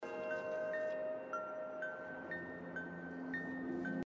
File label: walk cycle bridge; gris